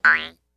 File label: Jews Harp Shrt Boin, boings